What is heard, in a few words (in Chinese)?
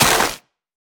红树根破坏声